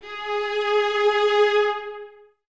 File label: STR G#0, String Ensembles, Violas; Miroslav Vitous; LINKS ORCH